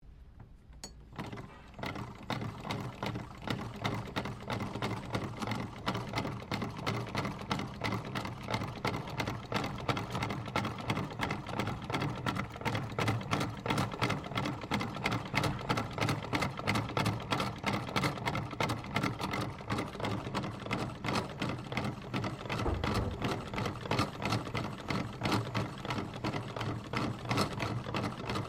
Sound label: SEW MACHINE SINGER HAND OPERATED EARLY 20C OPERATING